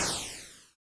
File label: sfx parry; LD55